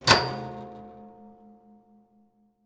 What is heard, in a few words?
impact metal fire grill vibrate resonate